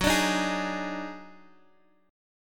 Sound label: Eb Minor Major 7th Double Flat 5th Gb